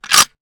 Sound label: weapon foley drop; Real Guns Sound Pack 2